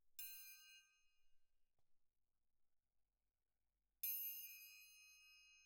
Perc

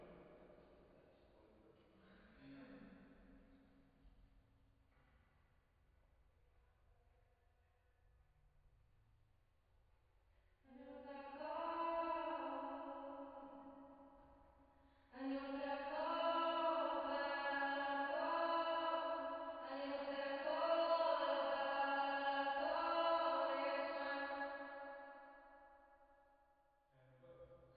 Choir

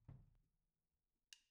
OH A

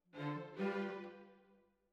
Cellos Over

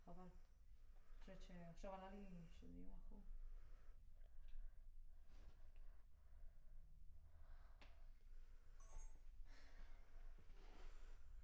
Children Choir; Studio Bekol Children Choir

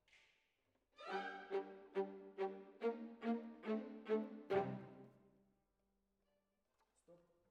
Violas, jazz for piano

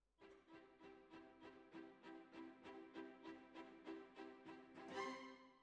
Oboe

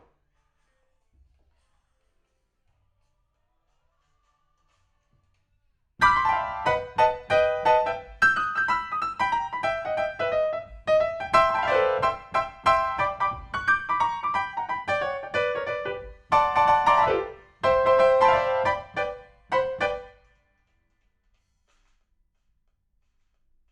PIANO, RNR for piano

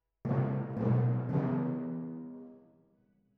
Timp

